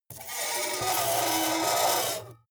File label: metal screech phaser deep